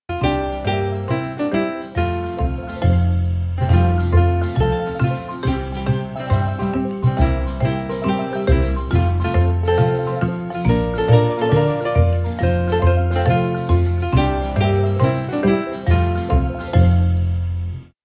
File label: Crossing The Bridge Start; peppa pig world adventures